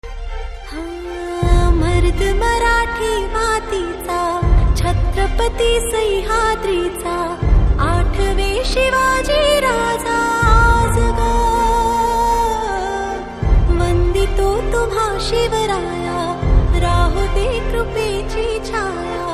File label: Female Ringtone; Panipat